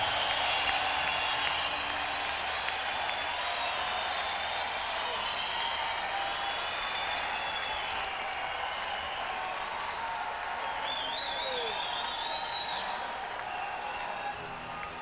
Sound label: Tuning